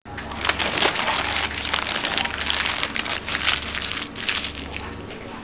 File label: paper